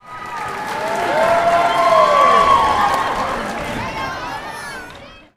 Crowd Cheer; Mike Koenig

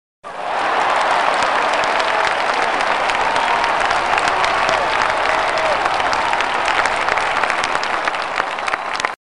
Large Crowd Applause

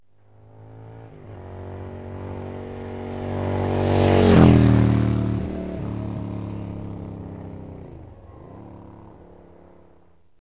motorbike passing

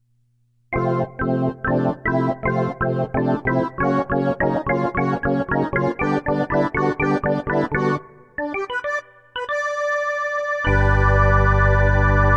Charge Theme Ballpark Organ

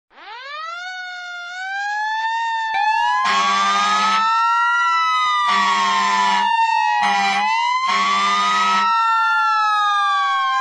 Fire truck sound effect (US)

